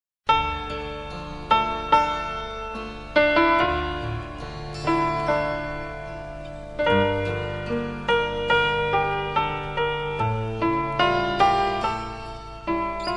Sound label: Sad Song; KABAMARU